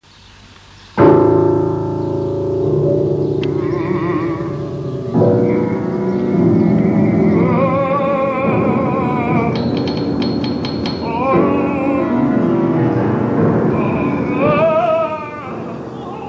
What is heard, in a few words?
Horror Soundtrack